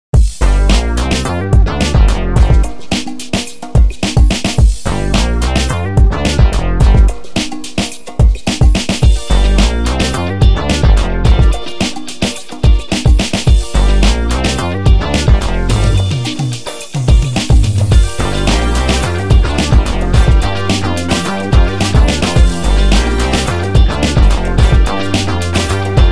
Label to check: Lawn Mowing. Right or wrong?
Wrong